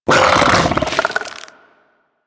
Bug Vocal Tranquillized Grunt; Warframe; Hunting Critters; Inf K Drive Bug

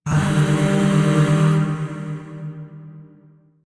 Shawzin Min Chord E; Warframe; Zariman Void Shawzin

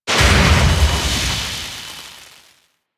Railjack Exterior Ship Wreck Sparks; Warframe

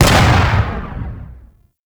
Throwing Knive Sticky Bomb Explosion; Warframe; Tenno

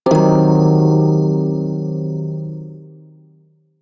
Prime Shawzin Blues Chord D; Music; Warframe

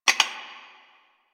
Mug Clink; Warframe; Quests; TheNewWar; Ambience; Zariman

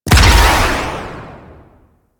Artillery Barrage Explosion; Weapons; Powers; Warframe; Archwing

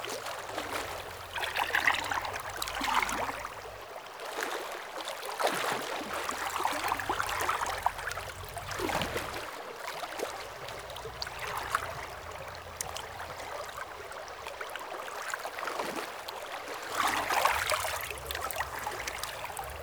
Water River Calm Lap Loop; Water Laps

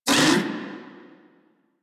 Amored Vocal Pain; Enemies; Armored; Warframe; Kubrow

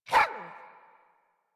Puppy Idle Bark; Enemies; Vocals; Kubrow; Warframe

